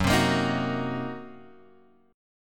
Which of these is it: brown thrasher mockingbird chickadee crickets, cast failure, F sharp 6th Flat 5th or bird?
F sharp 6th Flat 5th